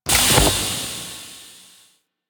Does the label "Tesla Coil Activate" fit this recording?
Yes